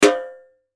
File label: avatar Hit SFX; rocket trip